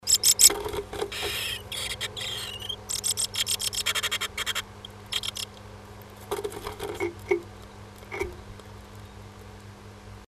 m fuettert w, nistkasten

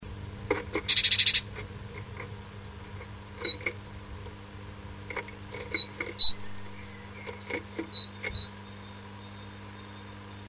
w ruft m, nistkasten

